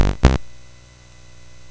Ducks Ahoy, MOS6581R4

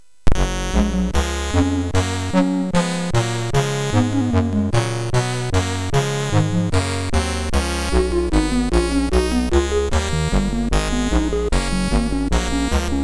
Classical, MOS6581

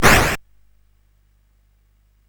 Dark Castle, CSG8580R5

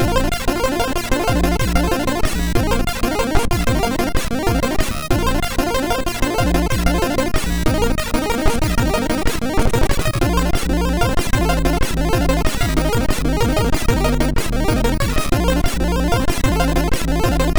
Splashing Waves, MOS6581R4

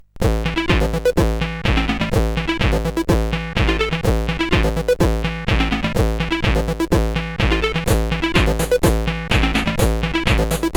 Short Acid Loop, sid CSG8580R5

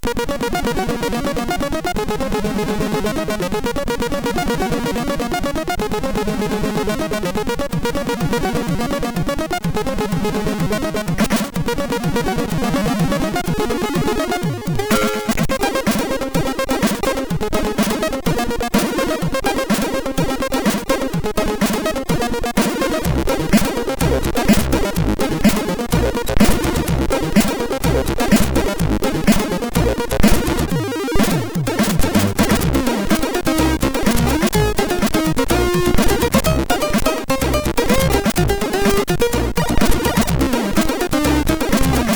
Natural Vibe, sid MOS6581R3

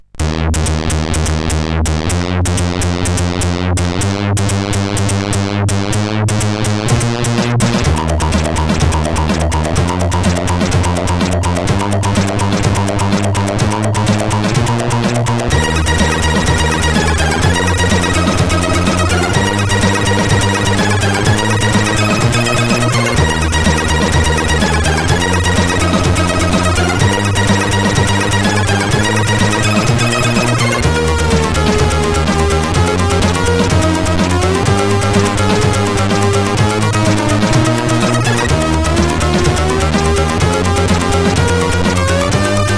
tune, sid 8580R5

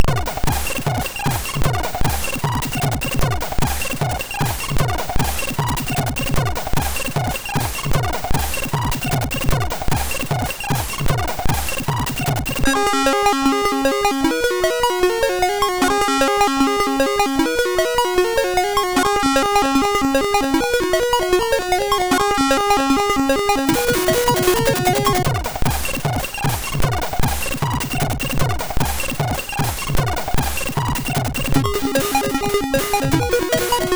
Anthem, sid MOS6581R3

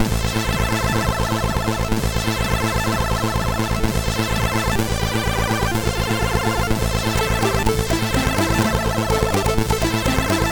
64, sid CSG8580R5

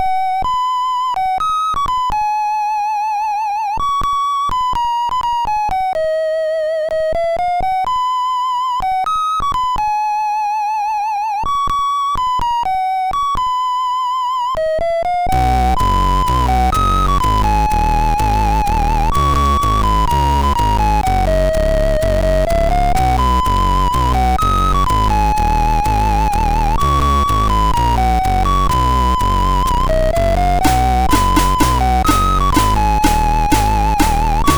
Military, MOS6581R2; Eloquence

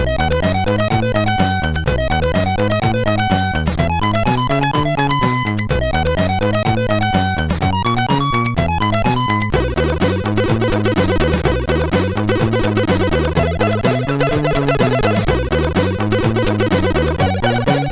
Clock Rock, MOS6581R4

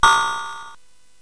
Fast Sfx, CSG8580R5 sid

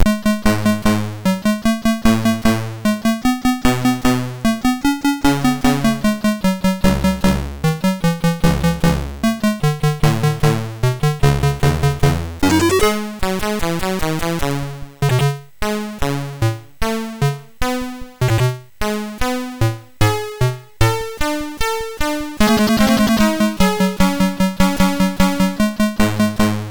Black Music, sid MOS6581R3

